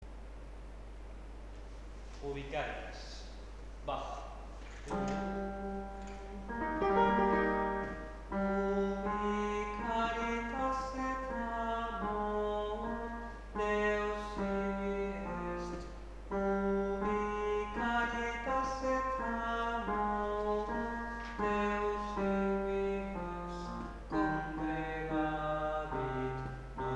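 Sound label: ubi caritas bass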